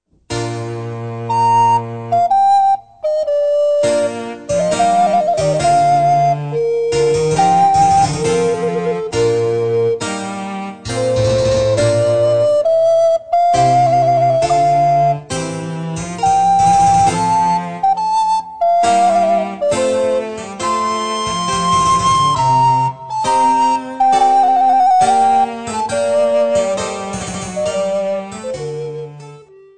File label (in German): sonate in b dur